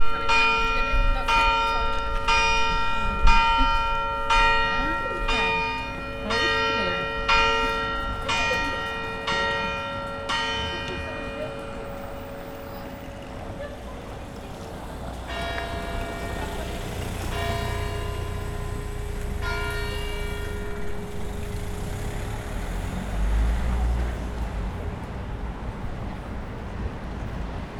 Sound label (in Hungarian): harangozas vege gepjarmu